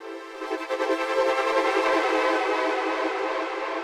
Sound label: SYNTHPAD HOUSE 125 A; eJay; Sphere